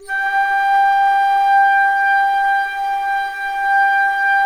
ALTO G4, ALTO FLUTE; USB Soundscan vol.23

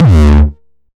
909 Dist Reso - D2, Kicks; Drums; Mbase 11 Kicks

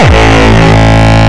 Longkick, kicks

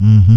MMM HMM; E-MU Formula 4000 Series Vol. 1; Hip Hop Nation; Phatt Planet

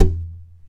TAP, FLAMENCO Guitars; Spectrasonics; Hans Zimmer Guitars VOL-2

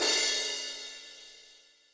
Crash, Yamaha PTX8

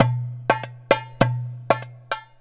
Clay Dumbek, Ethnic Percussion; Sonic Foundry (Sony Creative Software); Ambient Atmospheres & Rhythms

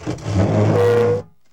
BOX SLIDE, Box Noises; E-MU Producer Series Vol. 3; Hollywood Sound Effects

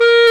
WND HARD, Clarinets, Clar Bryt; Roland LCDP04 Orchestral Winds; 90 s Sample CDs

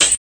RSS, 160 BPM

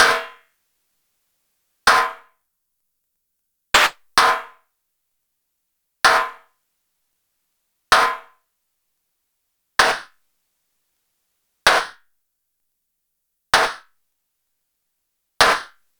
Snare High Quantity

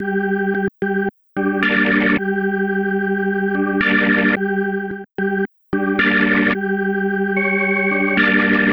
110 Gm Blister Organ, Melodic Loops; Electronisounds

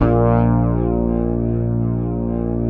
fingered bass A#1; Sampleheads; Will Lee Bass Library

